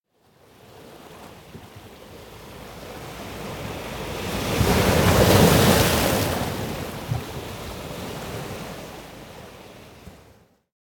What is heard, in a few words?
Ocean Surf On Rocks - Hard And Slow Waves On A Rocky Coast; Hollywood Edge; sound designer's toolkit v2